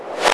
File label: 808 clp